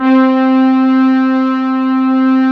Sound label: ENSEMBLE C3; HANSAMBLE